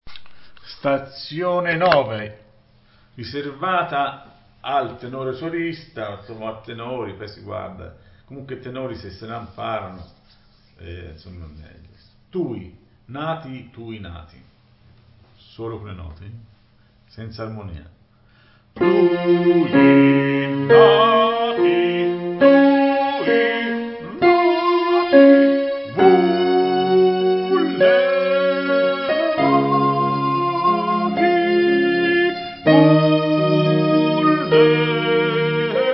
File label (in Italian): Tenore solo